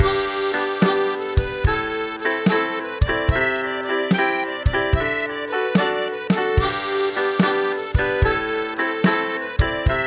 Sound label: Heavy Jig Drum Mix